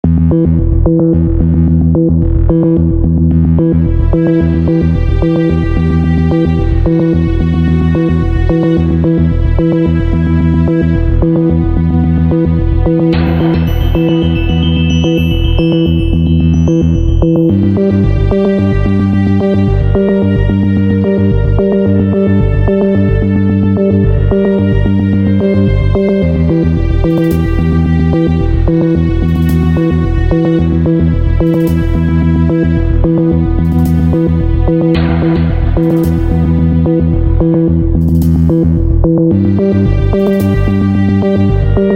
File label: arp